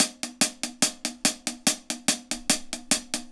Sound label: HAT, HI HAT LOOPS, Dub; Drumdrops In Dub VOL-1